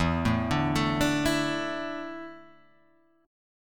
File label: E 7th Suspended 4th, Guitar, Drop D; chord shape